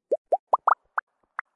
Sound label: soap bubbles pop